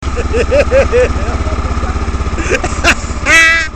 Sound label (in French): rire